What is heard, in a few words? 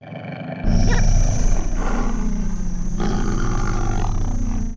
Wolf Charge Growl; Wolf Link